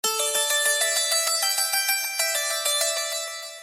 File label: Samsung S4 Ringtone; ringtones